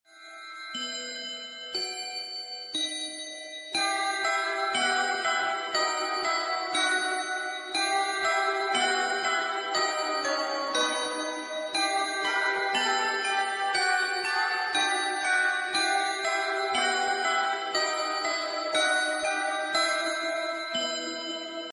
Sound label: iphone4 ring ringtone